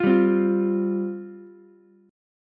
F Suspended 2nd F Ukulele; strummed; JGuitar

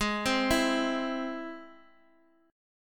Ab Augmented, Guitar, DADGAD; x,x,x,1,3,2

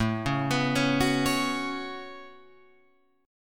A 6th Add 9th, Guitar, Open F; A6add9 arpeggiated; 9,8,11,8,9,8

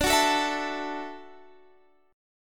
D-Major Add 9th, D, Guitar, Standard; strummed

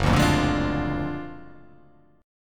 F sharp Augmented Major 9th F sharp

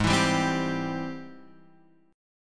Ab Augmented Major 7th Ab Guitar Open F; strummed; x,3,4,2,3,2; Ab+M7; JGuitar

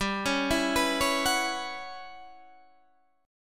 E Minor 6th Add 9th G chord

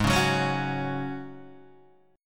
G sharp 7th Suspended 4th G sharp, Guitar DADGAD

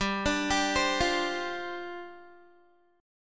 G 7th Suspended 4th G down Guitar

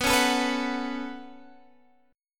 Db 7th Suspended 4th Sharp 5th B

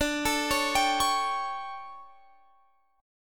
D Major 7th Suspended 4th, Guitar, Open F; DM7sus4; arpeggiated

